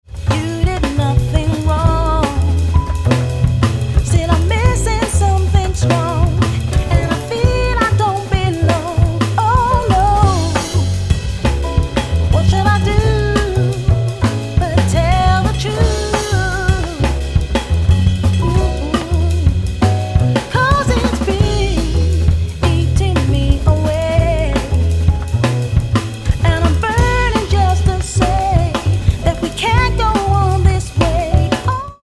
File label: soul bossa trio